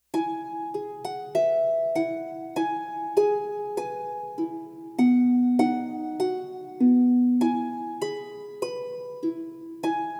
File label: Mary Had A Little Lamb Harp Version; Tiny Tunes; Narrative Mind